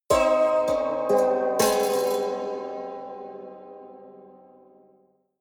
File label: haunted strings sequence hard